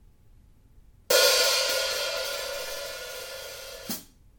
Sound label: 14in K Constantinople HiHats, cymbal